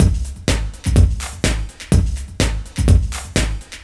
DEEPHOUSE BEATS 125

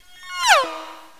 3D SWISH; KillerTweaksII; DirectWave

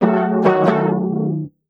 TECHNO SYNTH 140; Keys

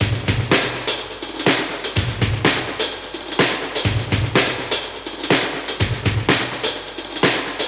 125 Classic Breaks, 125

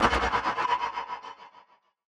Hat

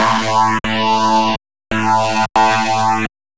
ELECTRO SYNTH 140 A

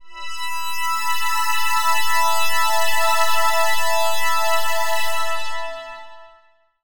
ELECTRO PAD 140 A; Keys

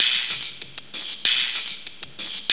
GUIROSH 96, loop; 0- 99 BPM

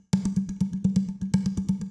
bottle, ethno, 125

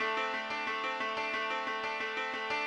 ethno, 90, D

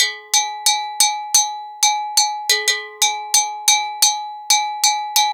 ETHNO EXTRA 90; ethno2

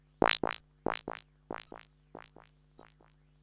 synth tekno 140 A; Techno2; Keys

